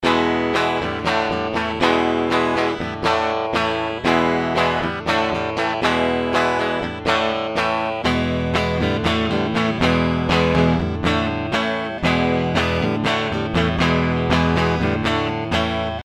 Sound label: pickup test